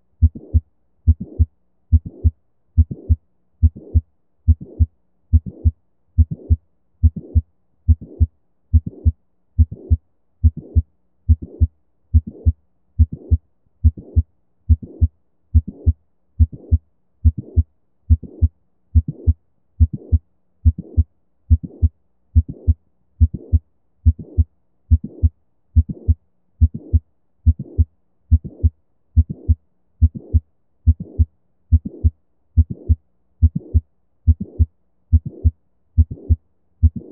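Apex, Systolic Click & Late Systolic Murmur, LLD, Bell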